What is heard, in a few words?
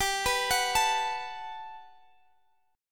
G Major 9th, G, down, Guitar, Half Step Down